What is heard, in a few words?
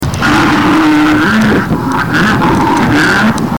bellow, cow